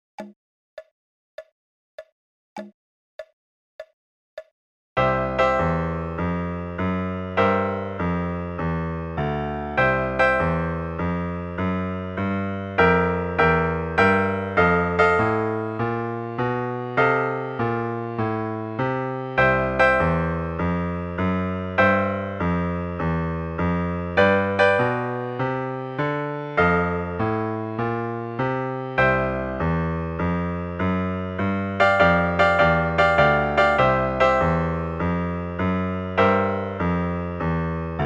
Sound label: Blues